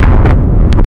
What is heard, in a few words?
a muffled sound of an, explosions